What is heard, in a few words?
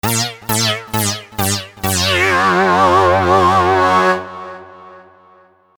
JINGLE pity